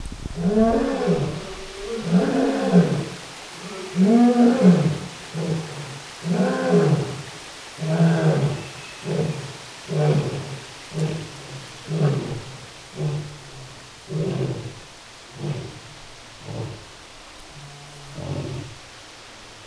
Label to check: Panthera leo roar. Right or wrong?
Right